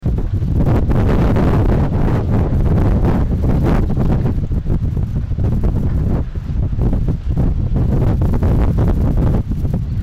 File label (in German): Wind